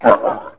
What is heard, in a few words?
lion snrl, animals cats; KABOOM! - Wild and Wacky Sound Software